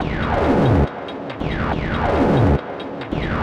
TECHNO FX 140

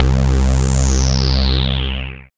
BASS MONO

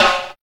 reggae snare drum; SNAREDRUMS

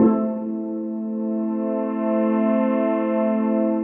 DEEPHOUSE ORGAN 125 A

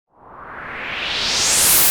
HOUSE FX 125

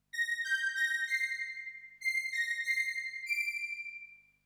SYNTH 96 G; old school

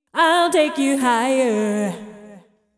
deep house female, 125, A, vocals

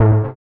Bass, One Shots; Touch Loops; BlissedOutBeats

